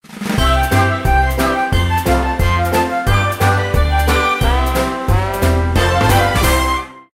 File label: win huge; Roaring Wilds